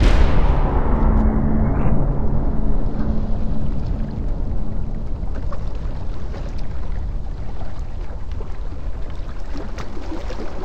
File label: STING Suffocating Dream, Cinematic Metal Impacts